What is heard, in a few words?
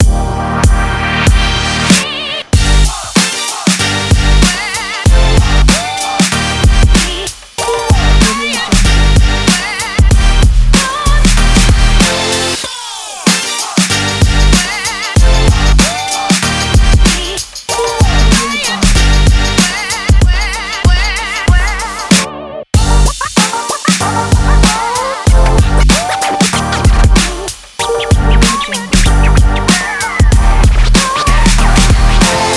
hiphop